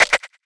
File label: Percussion